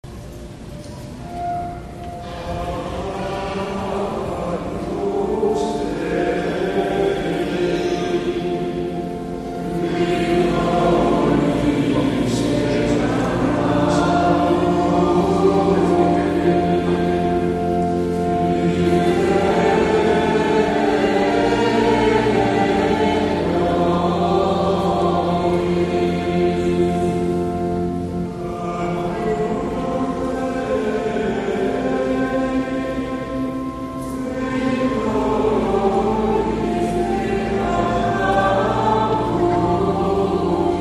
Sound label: Agnus Tutti; Messe des anges